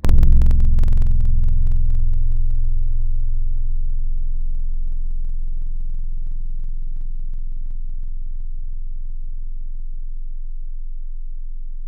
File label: Balalaika, Wavetables; Synthetic's Serum Collection; Standalone-Music SYMPHONY Wavetables